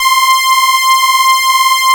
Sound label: OBERHEIM C6; Q Up Arts; Dream Experience